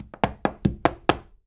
133 STEPS, Spanish And Gypsy

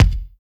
BEAT KICK

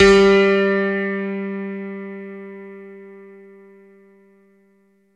JV E GRAND, JV-1080, PIANO; Syntec; Wall of Sounds

